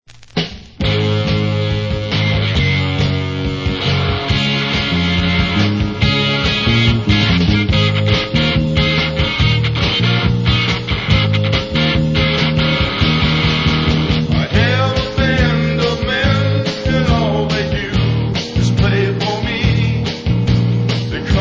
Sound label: Guitars